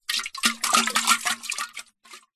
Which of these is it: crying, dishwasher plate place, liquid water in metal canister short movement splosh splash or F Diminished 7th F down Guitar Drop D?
liquid water in metal canister short movement splosh splash